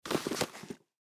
Combat Boots Pick, Combat Boots Pick Up; Carpet; foley; Shoe Sound Effects; Objects; Blastwave

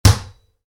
Football bounce, Soccer Ball Bounce On Concrete; Sports; Stuart Duffield

